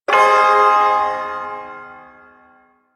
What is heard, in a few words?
Shawzin Min Chord I; Warframe; Duviri Ersatz Shawzin